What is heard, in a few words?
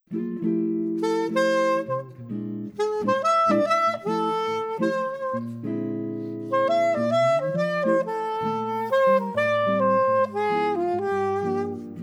Prelude